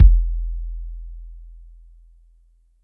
BD long, Yamaha DTXpress Electric Kick

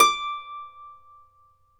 Celtic Harp, Plucked Strings

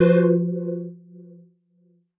stab glass, stabs

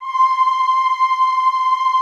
EHH C5, Classical Choir; Best Service; Extended Classical Choir; EHH MIX

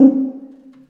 TUBA, Solo Brass, Tuba stacc; Roland LCDP12 Solo Brass; BRS Tuba

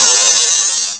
HH open, fresh disco house; USB Soundscan vol.20 - Fresh Disco House I

